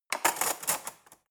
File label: cutlery fork drawer handle scrape